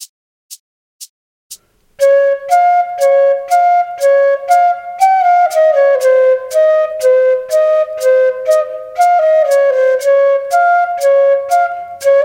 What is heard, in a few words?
Band music flute; Bansuri Student Zone